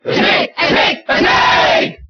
Cheer Spanish; Snake; SSBB

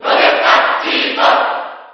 Cheer JP; Rosetta; SSB4

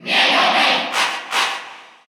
Cheer Russian; Mega Man; SSBU

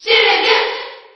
Cheer JP; Shulk; SSB4

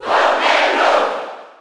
Cheer German; Jigglypuff; SSB4

